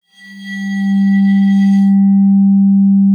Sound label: arco G3, vibes; Xsample VOL-4